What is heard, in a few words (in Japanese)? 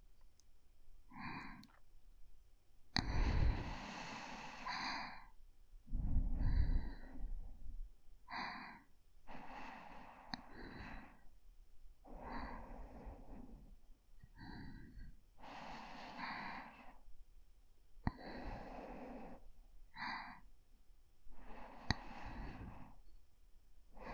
寝息